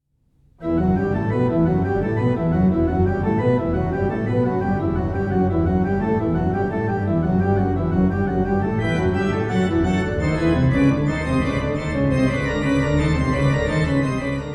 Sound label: riffs